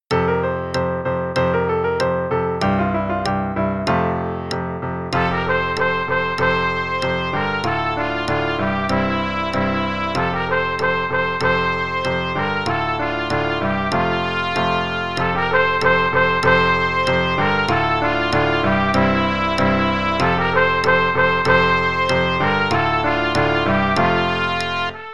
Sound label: Play-a-long, trpt and click, qn=96; SOE Bk 2 Renaissance Festival; trumpet